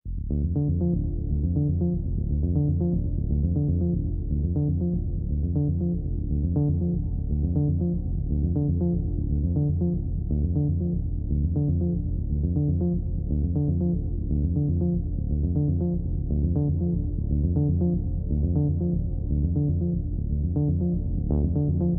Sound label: Solo Synth Version; Nordic Drama Textures